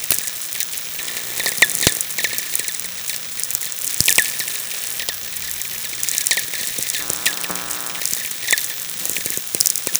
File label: vlf